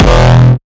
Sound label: kick oneshot monster E; GhostHack